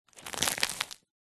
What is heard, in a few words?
food bread baguette tear or crush; zapsplat